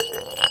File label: JAR ROLL C; Rarefaction; Poke in The Ear With A Sharp Stick